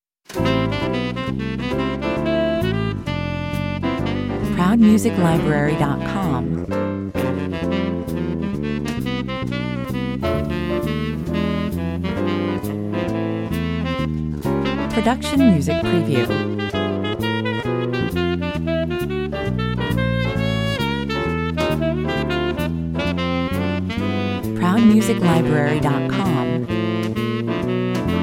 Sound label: tenor fun 106 bpm